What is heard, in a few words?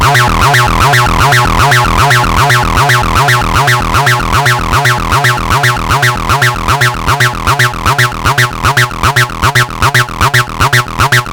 ts-303 dynamics